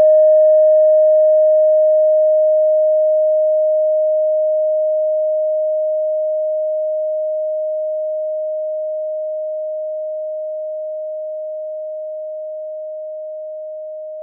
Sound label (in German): kleine klangschale